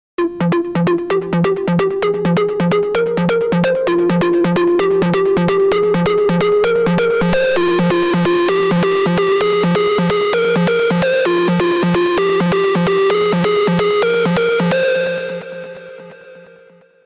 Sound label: osc noisy square